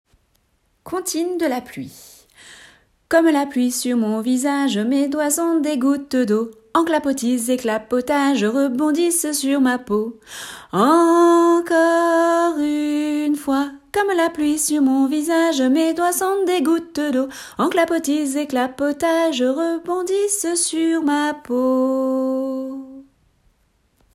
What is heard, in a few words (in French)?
La pluie